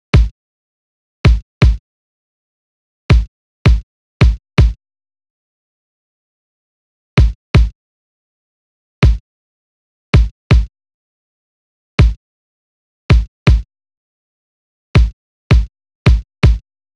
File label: kick B, Drum Tracks, 081 Cmin